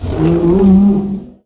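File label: troll hurt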